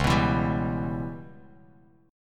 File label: C sharp Minor 7th Flat 5th C sharp Bass Standard; C#m7b5 strummed; 9,14,14,12; JGuitar